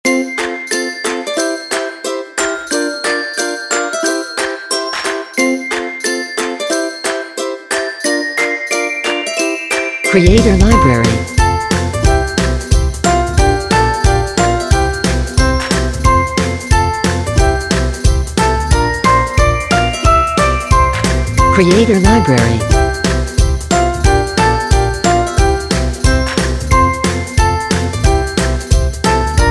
Upbeat

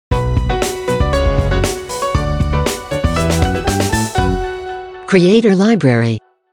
Confident Logo; Logo-Idents

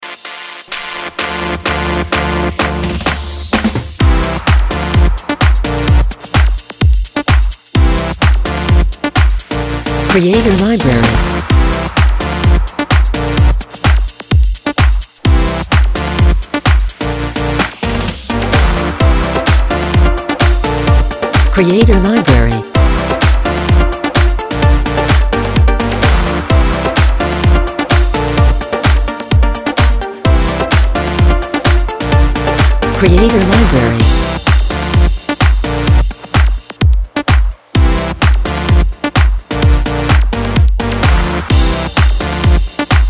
Ultra Modern, Pop

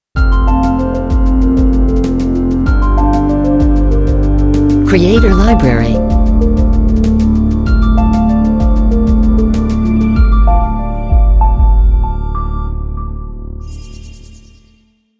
Ambient Logo; Logo-Idents

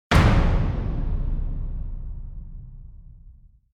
Trailer Hit

